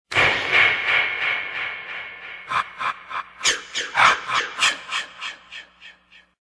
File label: Ki Ki Ki Sound; Jason Voorhees